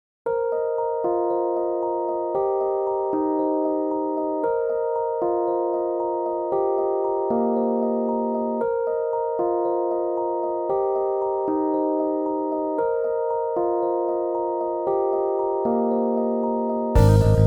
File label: rhodes strat